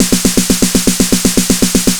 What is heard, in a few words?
Snare, Trance; Sonic Foundry (Sony Creative Software); Trance NRG VOL-1; Drums